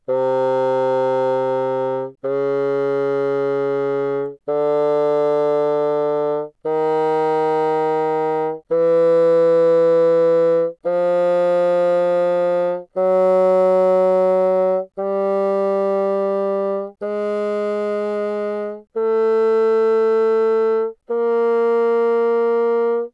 Bassoon ff C3 Bb3, woodwinds

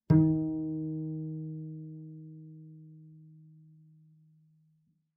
Bass pizz ff sul G Eb3, Double Bass; MIS Pitches - 2014; Strings

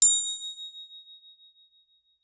Crotale ff Bb7 stereo; Percussion; Crotales

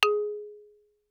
Xylophone hard rubber ff Ab4 stereo; MIS Pitches - 2014; Percussion

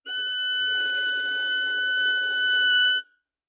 Violin arco ff sul E Gb6; MIS Pitches - 2014; Strings

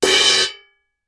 crash choke crash, Suspended Cymbals; Percussion

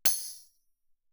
tambourine normal ff; Percussion

